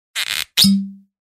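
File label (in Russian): probka, cork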